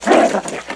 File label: ALPHA ORC DOG Attack